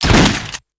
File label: Gun Fire; Weapon